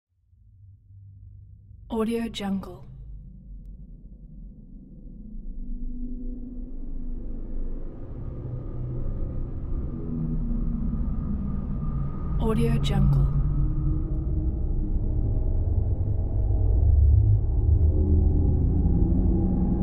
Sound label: suspense build up